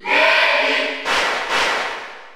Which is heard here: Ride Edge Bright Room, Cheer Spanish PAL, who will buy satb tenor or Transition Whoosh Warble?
Cheer Spanish PAL